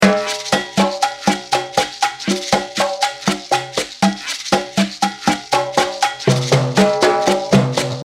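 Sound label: Drum 8 cuban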